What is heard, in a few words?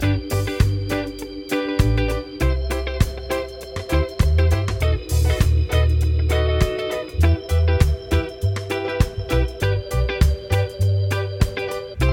progs with applied chords; harmony